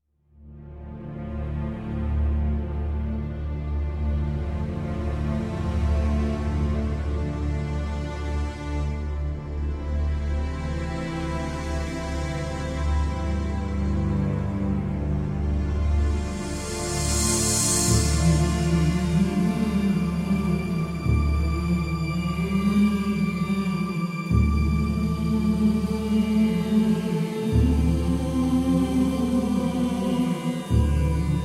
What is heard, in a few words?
Just Shifting Rooms (Instrumental)